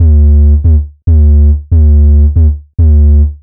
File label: 140 D BASSLINE, Dubstep